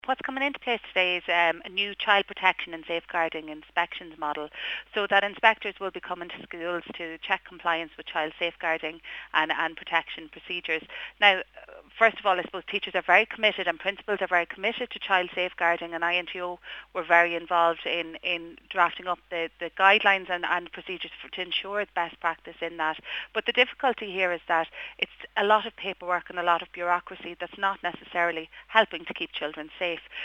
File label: sine